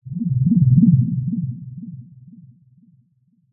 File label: cartoon anime swell warble power